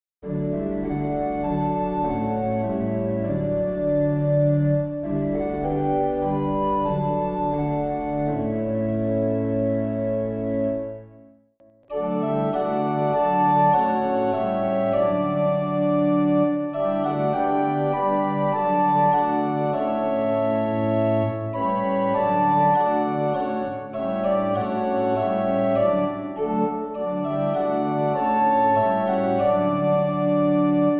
Hymn organ; This is my fathers world, set to Terra-Beata

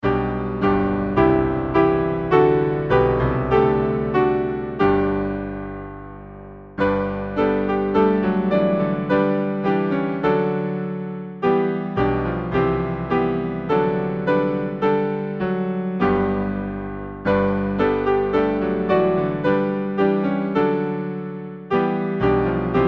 Hymn, piano; Blessed Jesus at thy word; Leibster Jesu

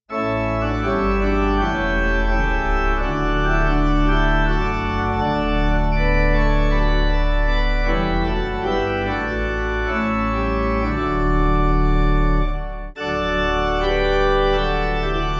Organ, hymn; Sicilian Mariners Sanctissima; Hymnal 1982

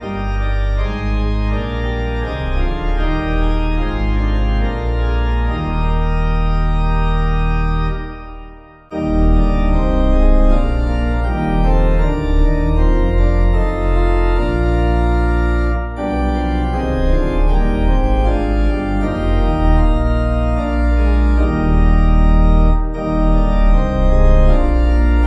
organ; ermuntre dich; Hymnal 1982

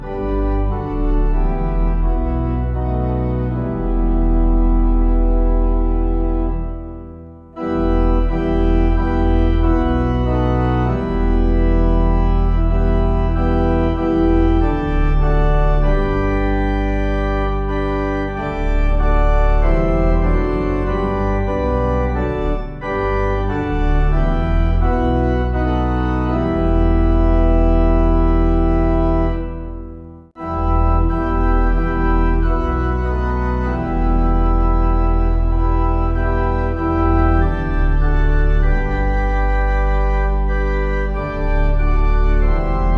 organ, Southwell; Lord Jesus think on me

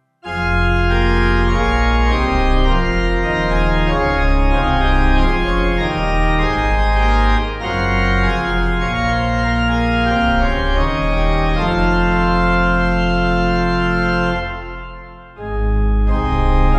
Pipe; O Brightness; Evening Hymn; Hymnal 1982

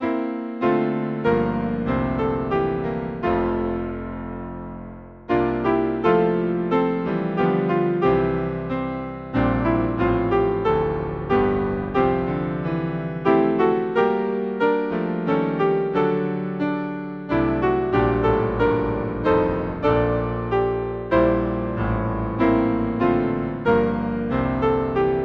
piano, Hymn; Like the murmer of the Doves song; Bridegroom

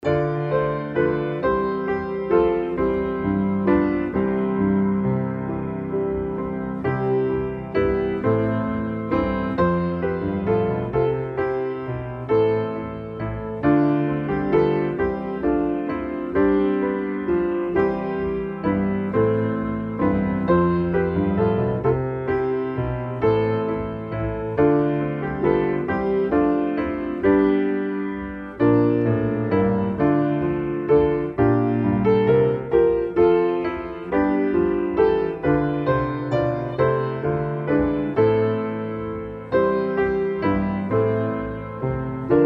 Hymn, piano; Holy Spirit ever living; Abbots Leigh

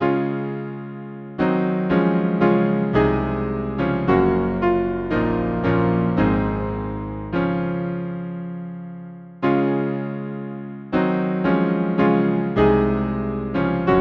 Hymn piano; God be in my head; Lytlington

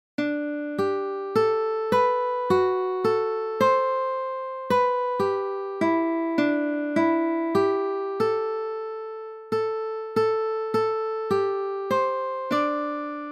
guitar; Hymnal 1982

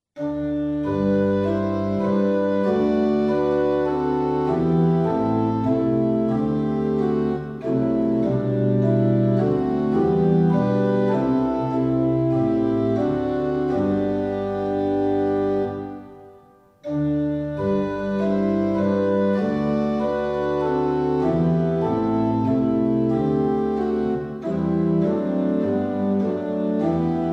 Hymn, St Clements; The Day Thou Gavest Lord is ended; Hymnal 1982

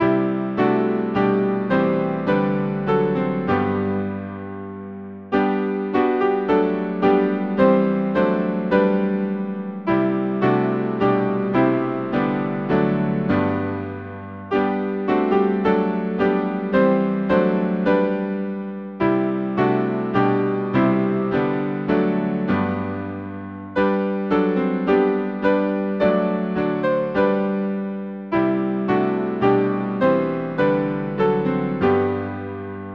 Hymn, piano; Praise to God immortal praise; Dix

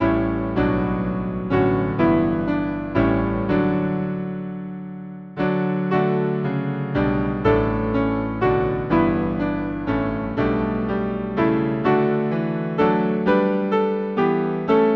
Hymn piano; When Christ was lifted from the Earth; St Botolph

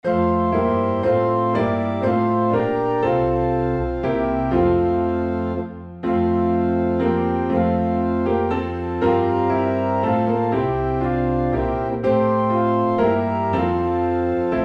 Hymn Piano and Organ in F; Come Thou Long Expected Jesus; Hymnal 1982

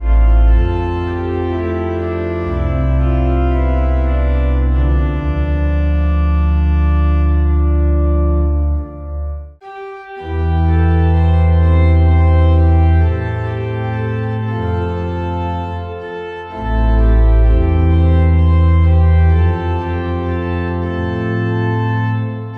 organ; rector potens verax deus; Hymnal 1982

